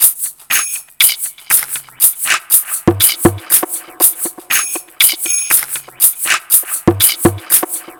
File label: Ethnic Drum Loop; Sonic Foundry (Sony Creative Software); The Electronic Music Manuscript Collection